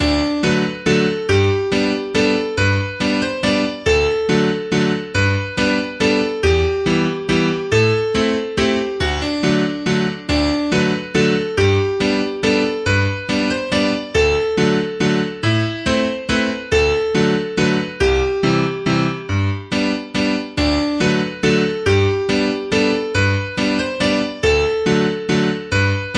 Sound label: Waltz; Midsummer Waltz